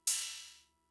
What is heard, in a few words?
Cymbal, Fricke MFB-522, Drum Machines